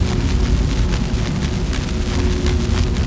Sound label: laser top rays